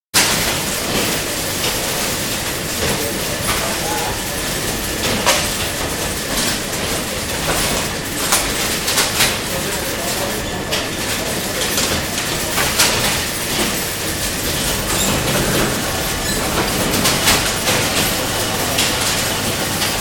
Linotype hall with proofing machines & metal cutters; 1930 onward; Machinery; BBC